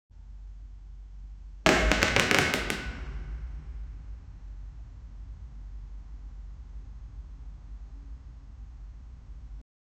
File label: falling ceiling sound, debris